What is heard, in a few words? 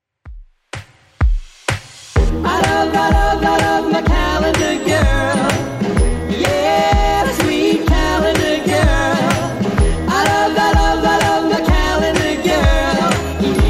60s Redrum 126bpm